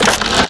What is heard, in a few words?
weapon pickup